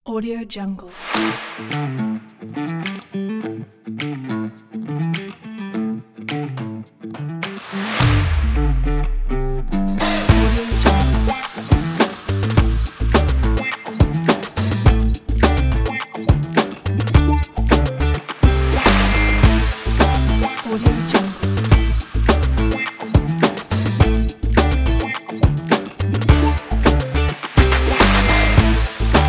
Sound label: funk background